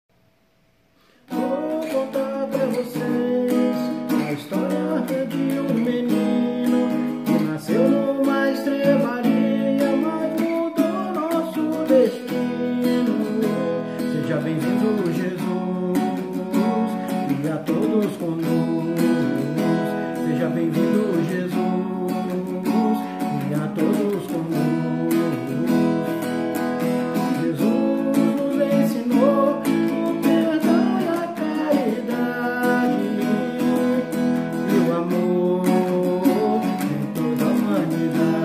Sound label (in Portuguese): viola